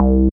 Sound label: C Bass